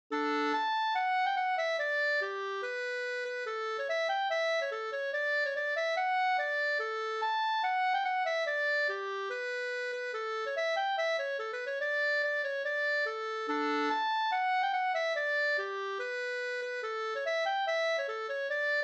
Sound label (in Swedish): schottis; cykelreparator Holm